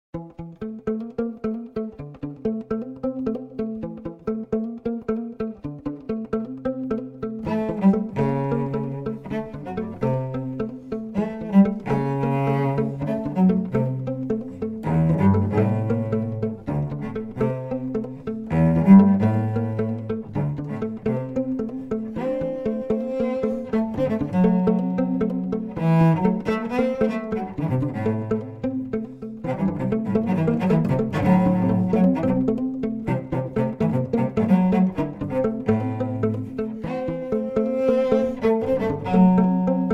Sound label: Samba; cello